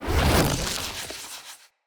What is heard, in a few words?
Fenn Casting Device Projectile Whizz By Heavy, weapons; Player; Casting Devices